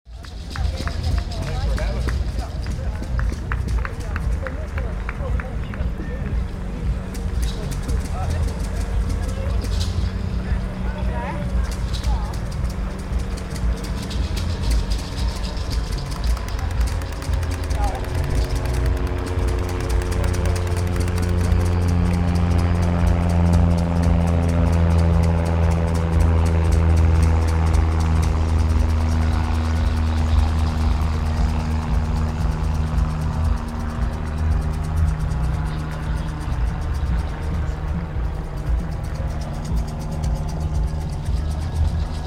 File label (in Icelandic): litil flugvel flygur yfir